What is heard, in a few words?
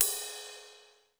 RIDE, Classic drum machines; SR16; musicradar-synth-samples; SR16CYMB